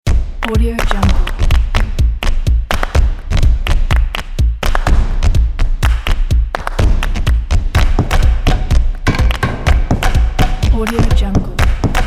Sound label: tribal soccer drums